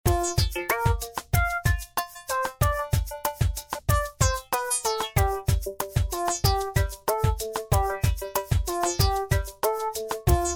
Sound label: Instrumentals